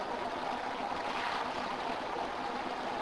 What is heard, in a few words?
Basement